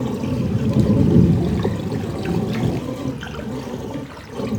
Draining Water Loop

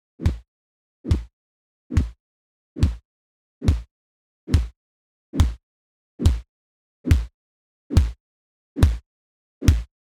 Punch In The Face

